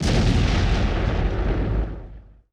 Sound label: WWII Explosion Single, Period Combat; Sony Pictures Sound Effects Series